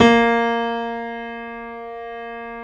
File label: JAZZ A#2, Keyboards Acoustic; AKAI; USB Soundscan vol.17